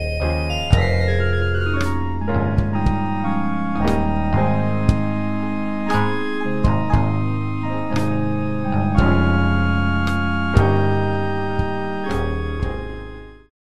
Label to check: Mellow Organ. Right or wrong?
Right